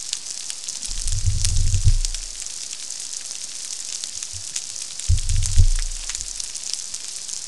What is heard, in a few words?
Unknown sp Growl Thump; Sand Island